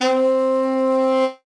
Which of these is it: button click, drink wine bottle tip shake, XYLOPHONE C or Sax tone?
Sax tone